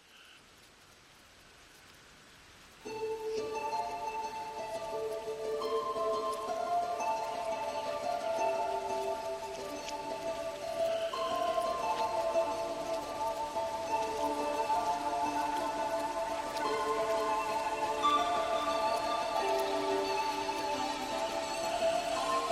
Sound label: Drum & Bass